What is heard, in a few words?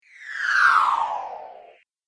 Whoosh Time To Fall; Sound Design; Whoosh Sound Effects